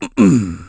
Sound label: "Ahem"; Chudley's doorman clearing his throat